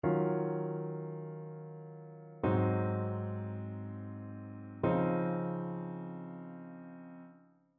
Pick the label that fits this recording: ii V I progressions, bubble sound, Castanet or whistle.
ii V I progressions